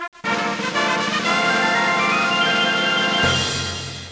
FANFARE; MAZEDOOM

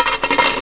COINS

